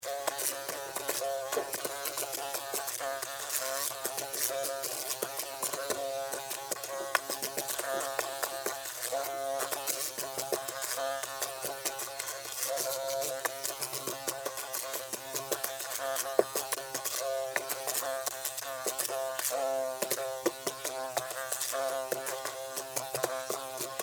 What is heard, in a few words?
Fly, Trapped, Inside, Container, Buzz, Wings, Insect, Bug; Sound Effects:Animals:Insects; Flies; Blastwave